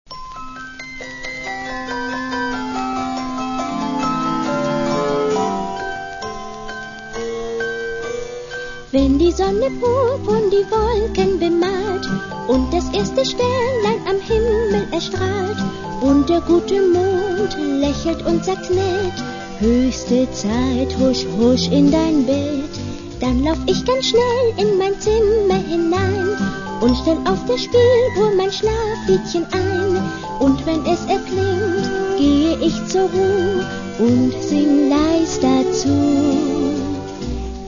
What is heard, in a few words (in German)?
Spieluhr